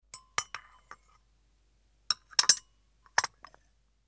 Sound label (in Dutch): Kopje Schuift Op Schotel; KMP Film Video Sound Effects